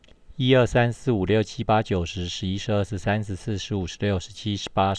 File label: riff